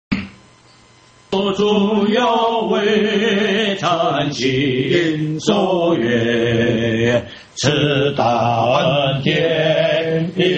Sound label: hymn; worship